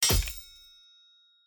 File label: armour Crack